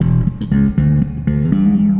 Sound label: Bass wet; Nimbus Station; lego universe macos windows gamerip 2010